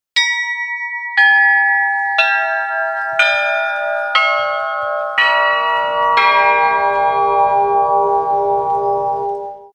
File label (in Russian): 7 kolokolov; Yaransk